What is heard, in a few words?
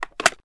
reload mag in, weapons; gmod; viper